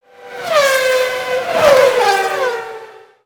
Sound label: Car Passby Pitch Up